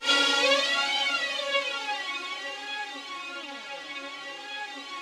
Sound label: Yamaha VP-1, ROUGH STRNGS, C#3; 90 s Sample CDs; Music Store